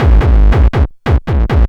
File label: DR 909 140, Hard & Loud Techno; USB Soundscan vol.01; AKAI